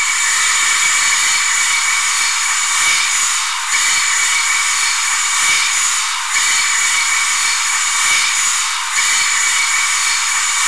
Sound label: Flange, CLASS 47, Train; OpenBVE